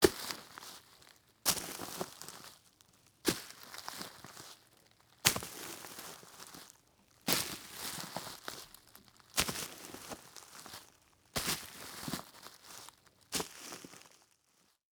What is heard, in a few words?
Foley Footstep Work Boots Leaves And Twigs Debris Slow Walking; Foley Footsteps